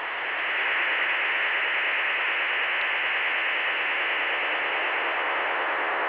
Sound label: psk 2400